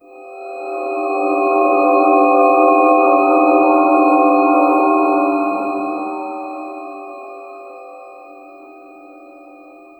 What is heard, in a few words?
creppy pixel ambience